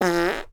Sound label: fart